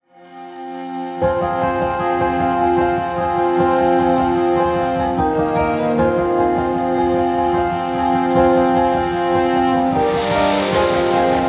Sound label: OCEAN ACTION, STRONG ADVENTURE